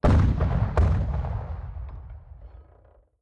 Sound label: distance artillery unit fire; Hearts of Iron IV Death or Dishonor